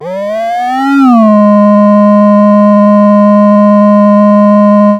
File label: transient